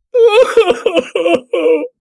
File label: Man crying